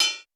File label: Roland U220 Electric Set, Drum Machines